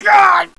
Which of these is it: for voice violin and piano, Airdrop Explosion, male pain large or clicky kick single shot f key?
male pain large